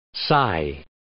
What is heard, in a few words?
sigh